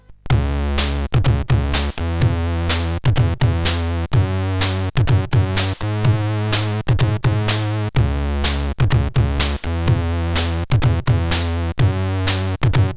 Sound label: Ambience, CSG8580R5